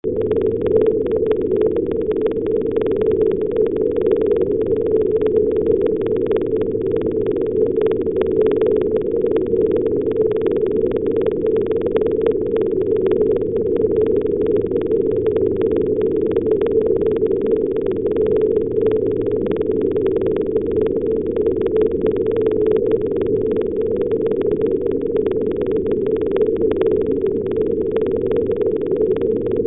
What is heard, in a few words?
ship small, seasounds